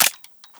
boxpunch; weapons, bianachi

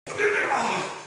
hurt heavy; billy

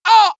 hurt med; cj

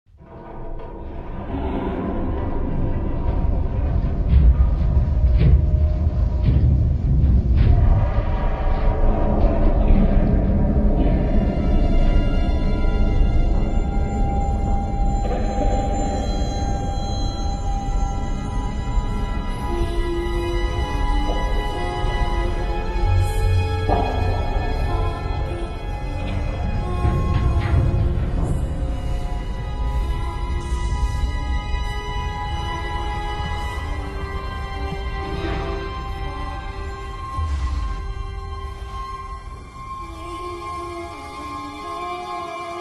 song; morbus

